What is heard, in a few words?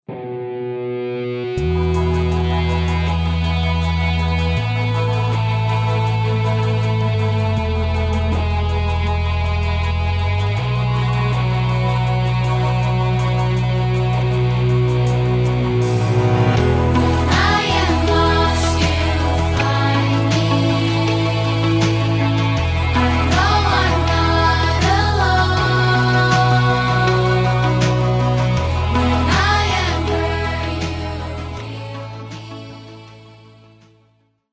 Contemporary Vocal